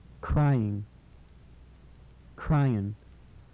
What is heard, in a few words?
crying